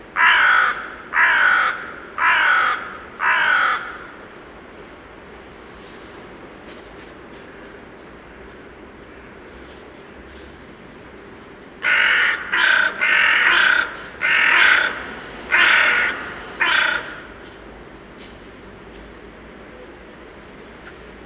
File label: corvus corone